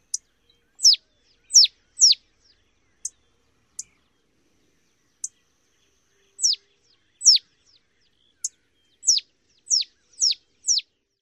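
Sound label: calls and song fragments; TX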